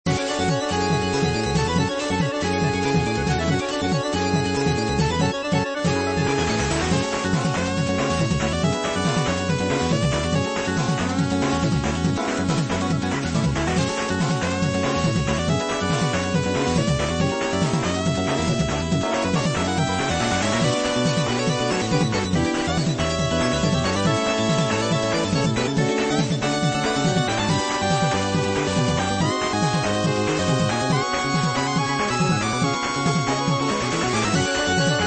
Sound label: RIDINGBEAT, BGM; sengoku